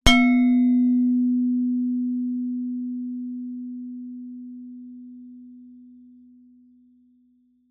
musical instrument gender gamelan single mallet hit mid low